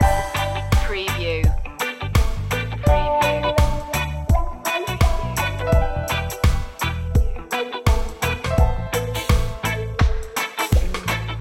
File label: sunny reggae loop